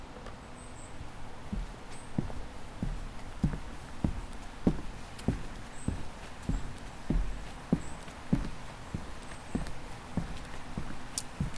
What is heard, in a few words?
Walking Wooden Bridge Fall Leafs Creek